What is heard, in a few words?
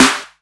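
Snare Noise, drums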